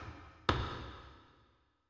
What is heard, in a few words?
bottle, ethno, 125